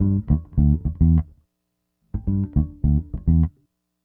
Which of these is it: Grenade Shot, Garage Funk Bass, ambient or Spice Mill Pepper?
Garage Funk Bass